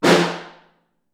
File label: Far, Snare